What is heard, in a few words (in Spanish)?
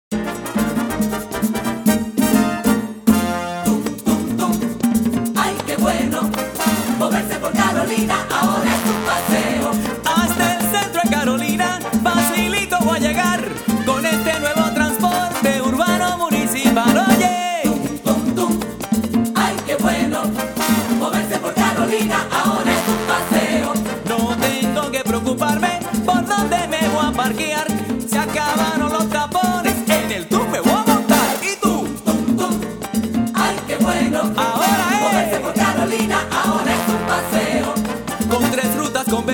Jingle